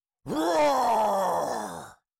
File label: Cartoon lion voice roar